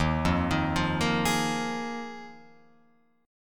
D sharp Suspended 2nd Suspended 4th D sharp Guitar Open F; arpeggiated; JGuitar